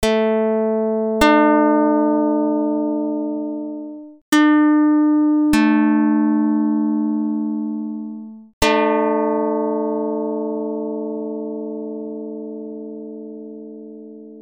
pluck 220; Jid 48/35

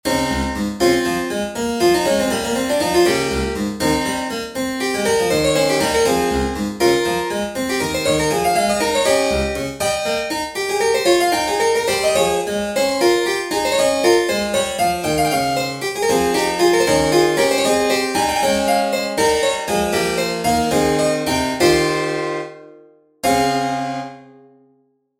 Diasem Lydian Example 31edo

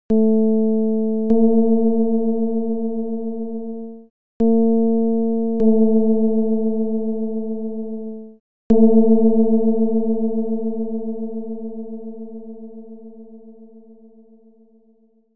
csound foscil 220hz

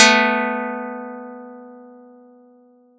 pluck; Audacity; 2 12

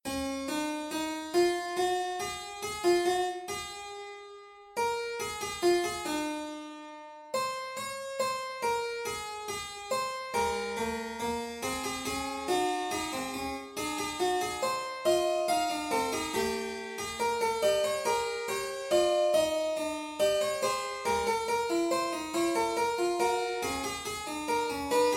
19edo Semaphore Fugue

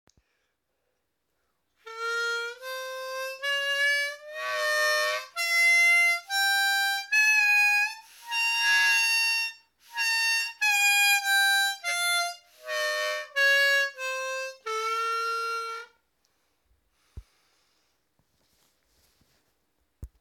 Harmonica Rast 11th Pos.

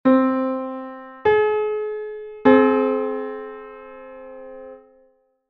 neutral sixth (10-EDO)

